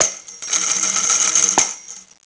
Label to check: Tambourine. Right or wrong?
Right